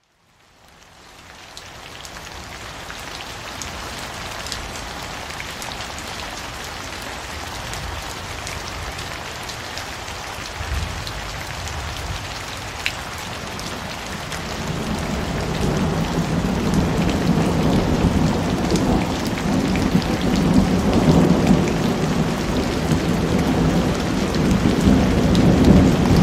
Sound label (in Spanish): Sonido de Lluvia y el Sonido Blanco